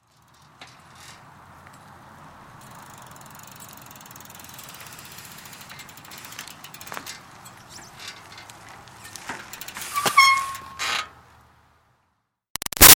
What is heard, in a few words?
Bicycle Retro Cruiser Up Stop Slow Brake Squeak; Vehicles; BigRoomSound